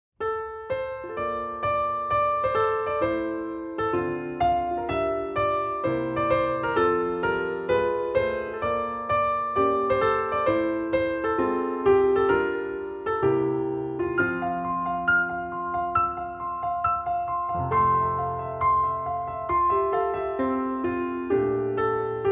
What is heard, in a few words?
piano ver.